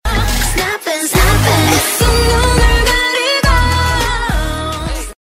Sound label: snapping